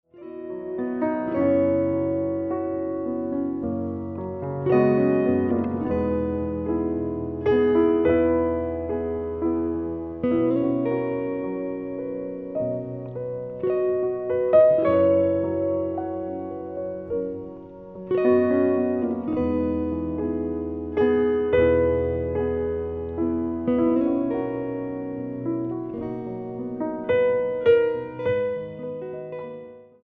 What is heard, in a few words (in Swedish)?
piano och elgitarr